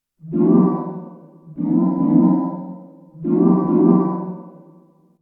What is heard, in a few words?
Ghost Choir